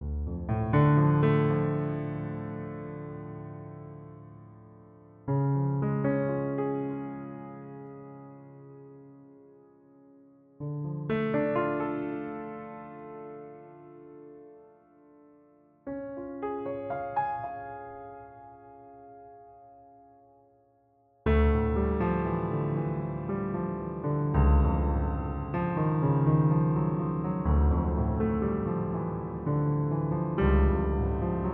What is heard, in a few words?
Piano Dramatic